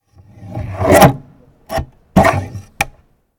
Paper cutter

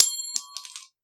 Light Metal Hitting Ground

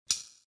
Click

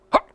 Hop

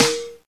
Snare

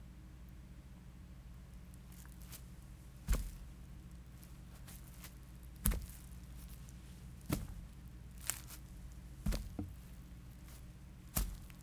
Stomping Footsteps On Grass

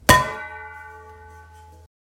Pan Hit

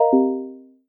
Disconnected

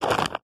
Item Pickup

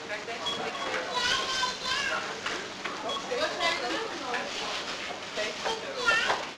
Supermarket

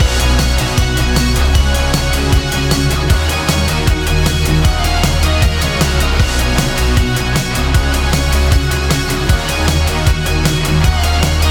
Neon Rider Loop

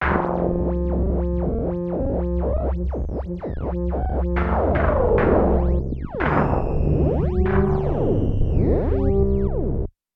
Envelope Lfo Twister

